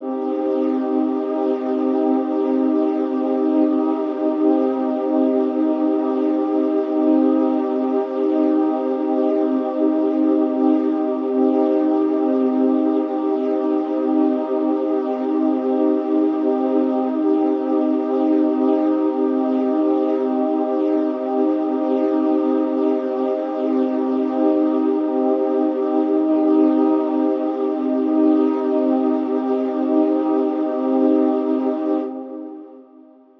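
Tape Stasis, sustaining a C minor chord in two octaves; vocal samples; Void & Vista; Kontakt; Foundations/Tape/TPE 23 Stasis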